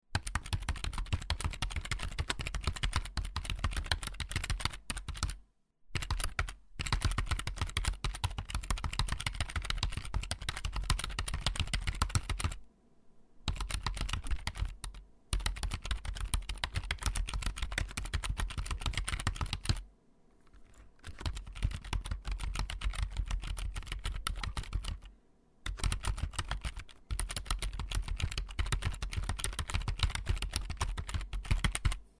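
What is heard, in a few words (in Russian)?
звук печатания на клавиатуре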